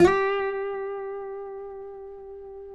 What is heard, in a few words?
FINGER, Guitars; TAKA